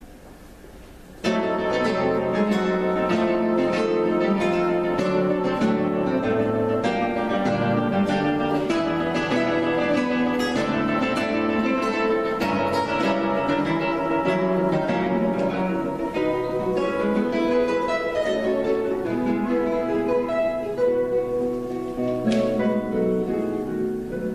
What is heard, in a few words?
croatian guitar; ringtone